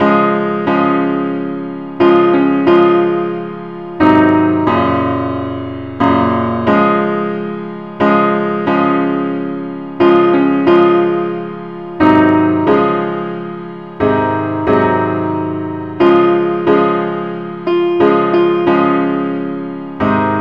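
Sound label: simple accompaniment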